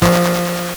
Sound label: LSDj, Nintendo Gameboy; 300 Drum Machines